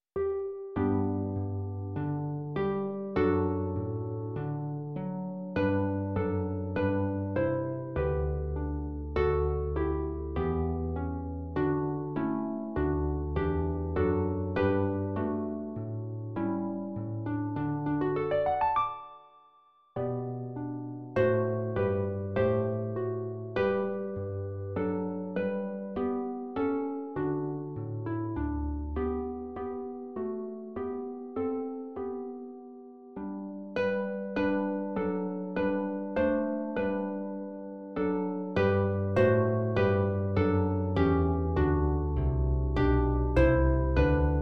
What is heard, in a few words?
Carols for Solo Harp